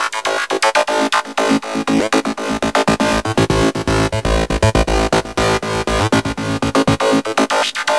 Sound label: Lead & Arpeggio; Sony Creative Software; Crimson Blue and Fabulous Horncraft 4 RnB; Loop Samples; acidtechnoexpander